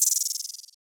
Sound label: OH, Open Hats